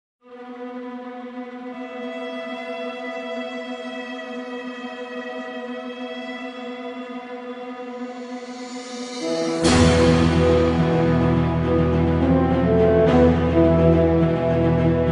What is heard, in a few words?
Orchestral Theme Music; Daring Doo